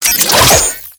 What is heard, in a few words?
Ink Rifle Reload, Weapons; Warframe; Tenno